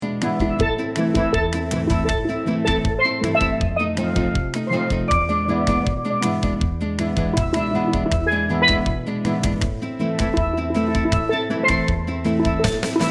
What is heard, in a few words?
steel drum band